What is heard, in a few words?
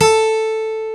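acoustic guitar, country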